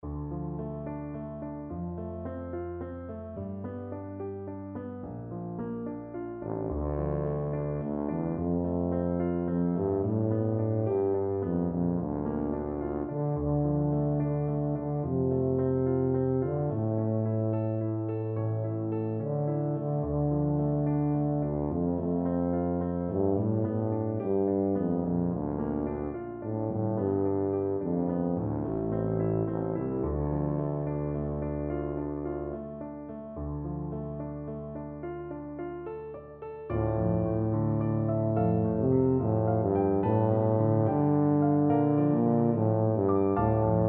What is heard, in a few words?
tuba